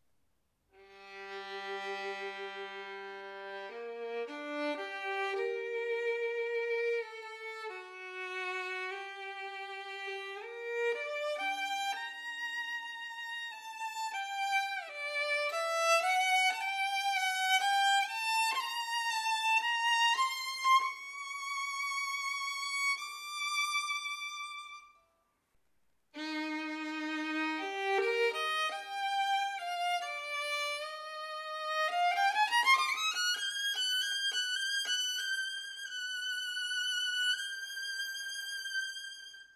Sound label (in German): tragend singend kräftig